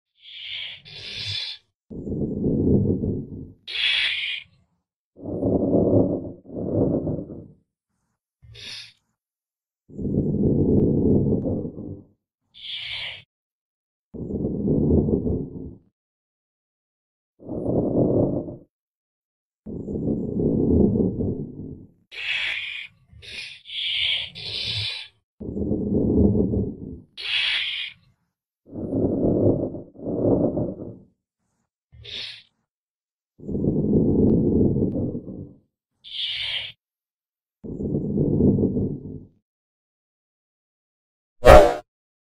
opossum; صاریغ

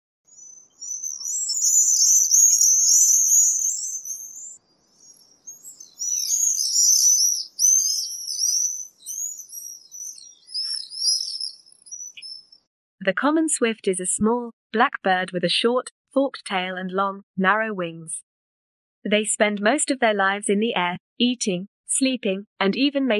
Common swift; پرنده بادخورک معمولی

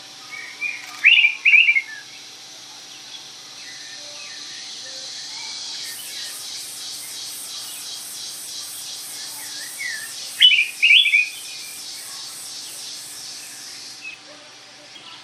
araripe manakin

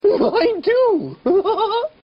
laughing; pennywise

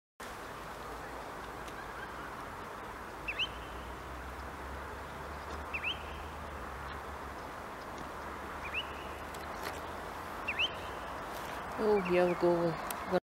Spotted Redshank; آبچلیک خالدار

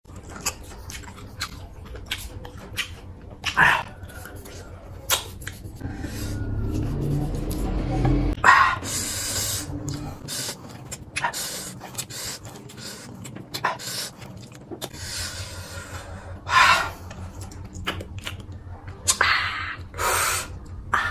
Eating lemon

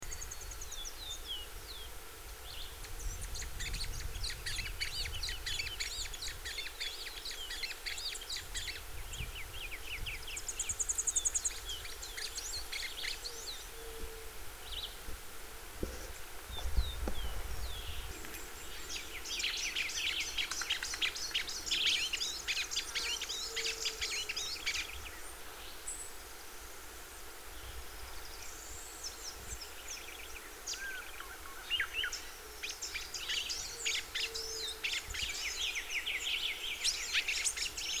Magpie tanager

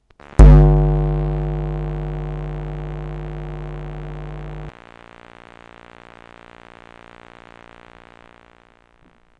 electro magnetic; مغناطیسی

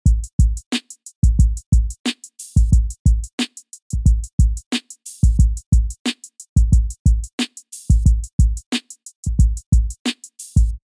drums rap type south loop

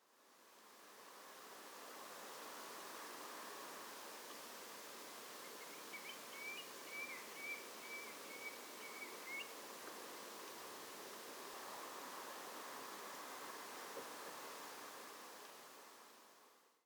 Eurasian stone curlew

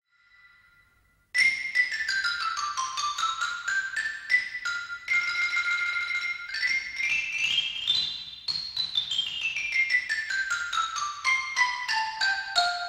Xylophone

